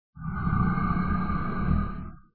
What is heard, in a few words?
castle dragon snore